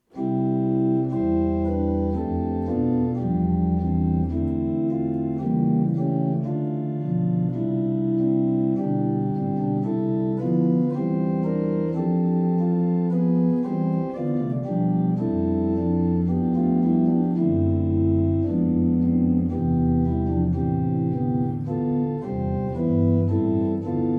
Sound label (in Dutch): Orgel